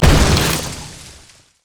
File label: bushwhack set; nidalee base